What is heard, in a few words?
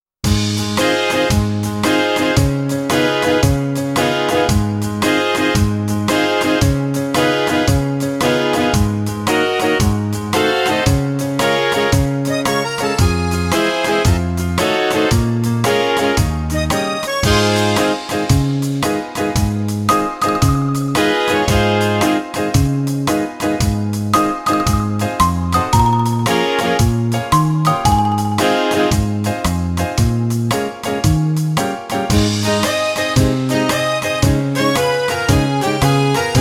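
minus A flat dur alto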